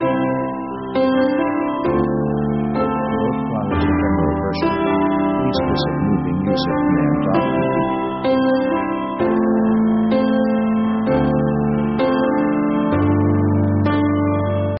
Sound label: piano 65bpm anthem; orchestra themes